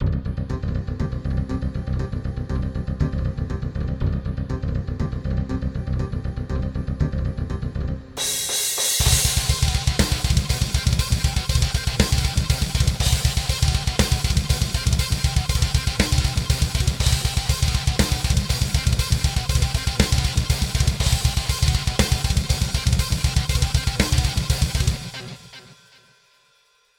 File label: Metal Insanity Intro